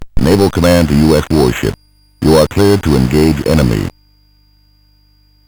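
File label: Ocean Ranger, MOS 8580 SID chip music, games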